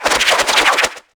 arrow swish

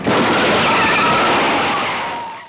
BOXES; PC Gamer IT CD 45; DRIVER

